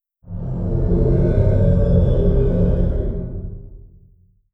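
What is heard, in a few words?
distance shield hit layer; apocalypse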